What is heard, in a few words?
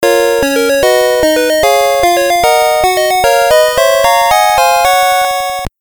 Unused Fanfare; Pokémon Red Green beta; GB